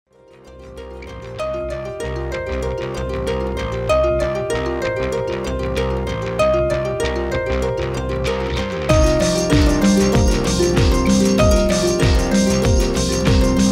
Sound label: Sonic beat; Moai